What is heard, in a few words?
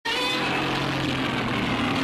green roar